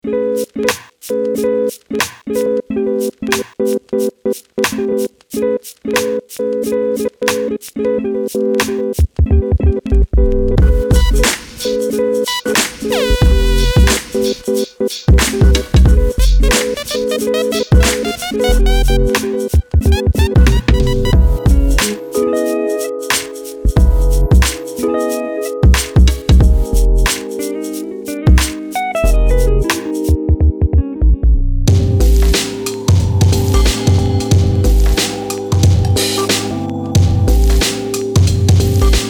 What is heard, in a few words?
Rap Tonez; maschine expansions; helios ray